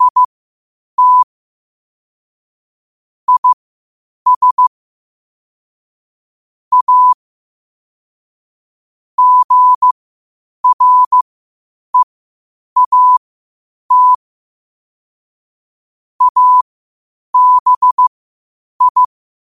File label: 5 wpm, morse